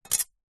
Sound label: ruler plastic 15cm set down on wood